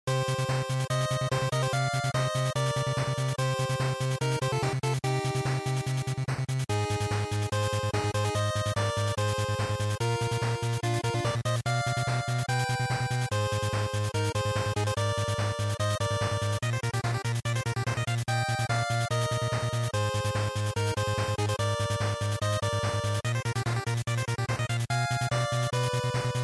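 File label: Game Boy Action Stage, bgm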